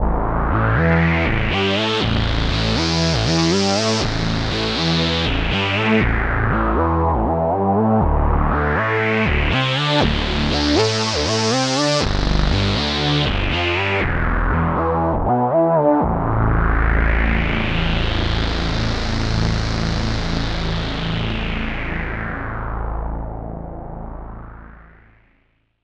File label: analog jitter thick